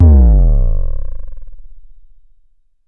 vermona drm1 mk3 tom

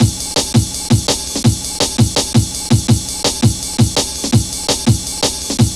Harsh, Drum Loops 166.5; Zero-G Jungle Warfare 1, 2 & 3

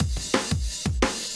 Breaks 175, Drum&Bass; EZRollers

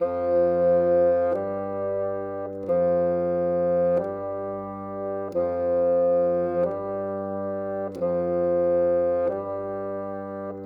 Bassoon, Orchestral; Sonic Foundry (Sony Creative Software); Orchestral VOL-2; Modern 25

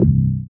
BAS, Dance Bass; Sound & Vision - Gigapack II CD 1

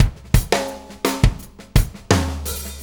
Drum Tools, Loops

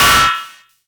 Percs, Elektron MachineDrum; SPS1 MKII

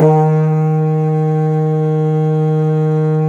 HORN, Didgeridoo and Other Primitive Instruments; Big Fish Audio; DIDGEHORN1

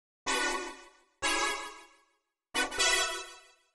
125 Soft pad E, Keys, Nu Jazz House; PowerFX - Nu Jazz House

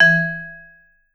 E2, VIBE; Sampleheads - Dave Samuels Marimba & Vibes

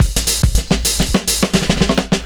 FILLS, 70_s Breakbeats; USB Soundscan vol.46; AKAI

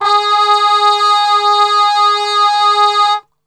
G#2, Brass, TRP CUP MUTE; Best Service; Brass Super Section; 90 s Sample CDs

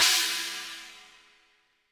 HiHat & Crash, Roland TD-20; HiHats

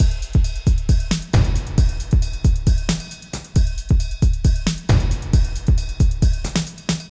Beat - Full, Grime, 135bpm; Zero-G Sound Sense Grime Scene; Construction Kits; A Positive Vibe

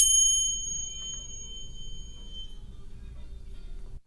HND CYM RING, AMB SOUNDS

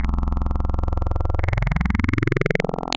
Digital Feline, wavetables; Waves Codex

